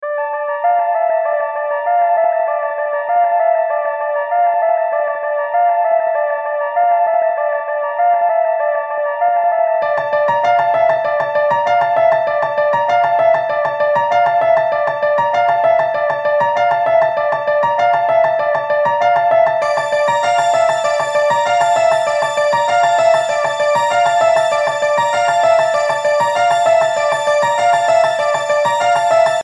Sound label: 196 BPM C minor